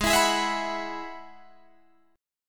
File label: G sharp Minor 7th Sharp 5th G sharp down Guitar DADGAD; G#m7#5 strummed